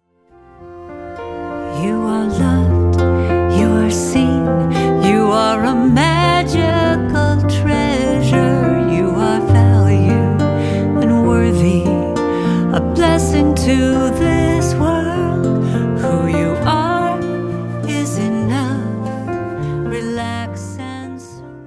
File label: Lullaby